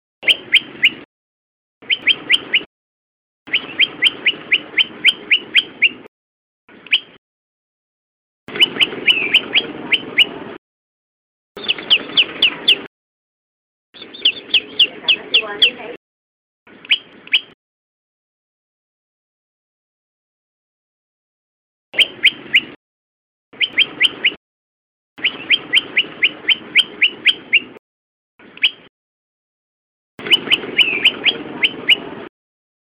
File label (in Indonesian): suara burung prenjak lumut